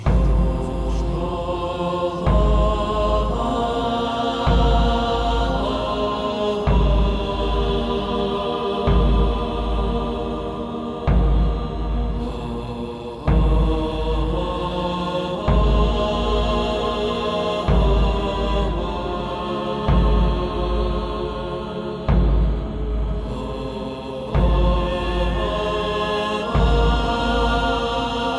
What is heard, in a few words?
tamana vocal